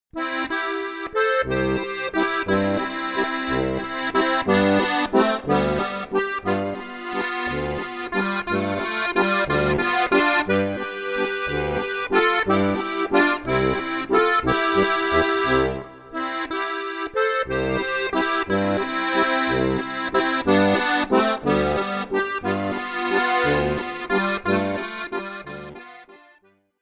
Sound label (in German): Walzer